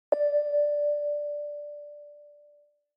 Flight announcement tone airport departure single beep